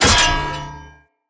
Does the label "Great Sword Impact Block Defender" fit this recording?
Yes